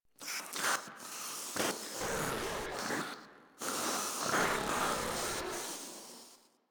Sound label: Proto Infested Runner Idle; Warframe